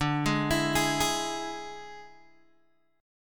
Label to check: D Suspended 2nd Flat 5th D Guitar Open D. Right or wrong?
Right